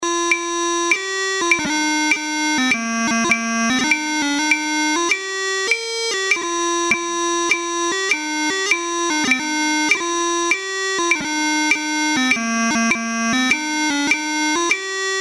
double beat 100bpm, pipe band; Willies Brouges; highlanders

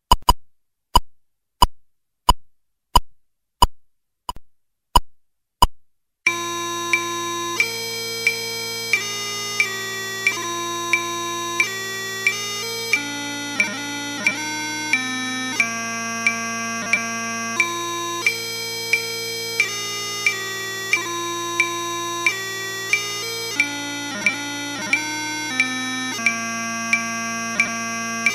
90 bpm, pipe band; Blue Bells; highlanders

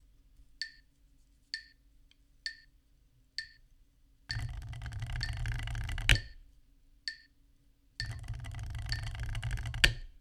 Snare 65 BPM; Loch Lomond; drumming

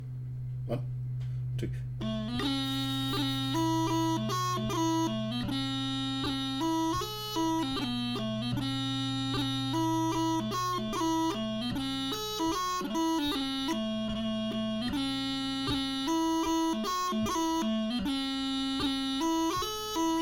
Siege of Delhi 76bpm, piping; highlanders

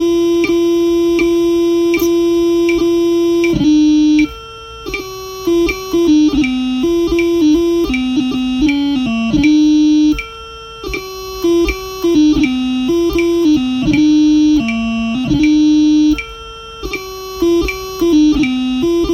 Jig 80BPM, piping; Barbaras Jig; highlanders

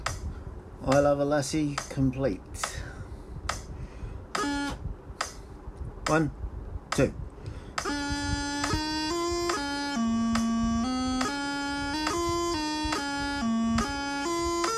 full tune 70bpm, piping; highlanders

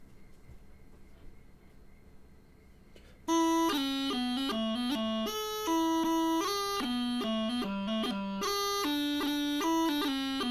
Inverness Gathering 76bpm, piping; highlanders

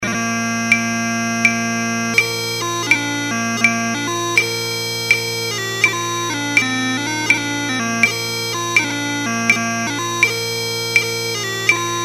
82 bpm (pitch 460), piping; Steam Boat; highlanders

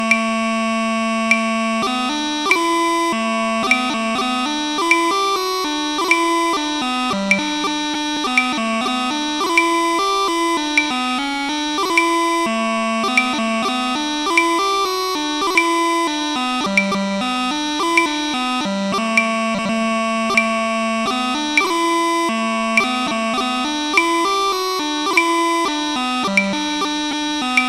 Walrus - 50 bpm, piping; highlanders

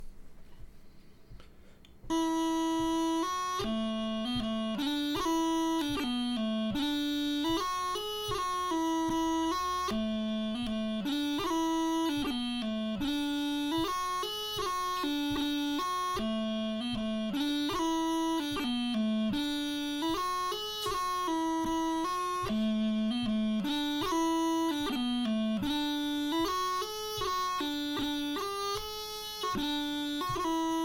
Corriechoillies 76bpm, piping; highlanders

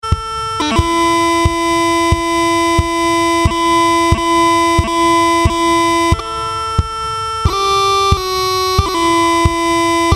Blue Bells - twice thru- 90 BPM, piping; highlanders

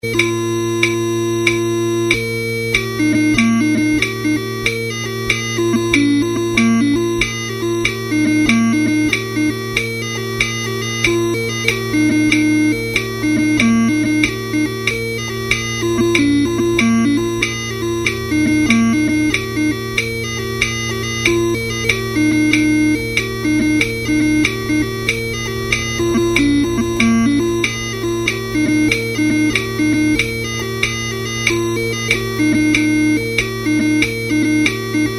Irish Washer Woman, 94 bpm; highlanders

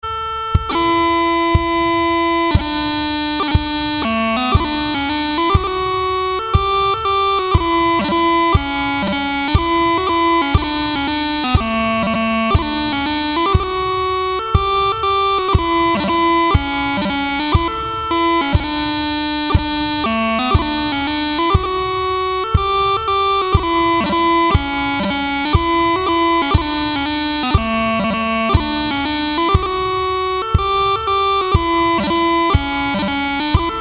University Hill 60 bpm - beats, piping; highlanders

